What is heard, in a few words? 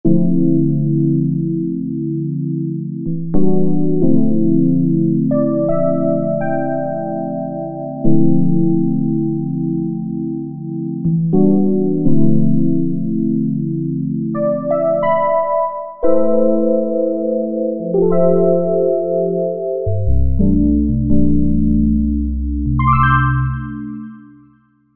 SYNTH BASICS; Martian Lounge; Apple GarageBand 2